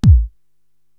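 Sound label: Bass Drum, Drum Machines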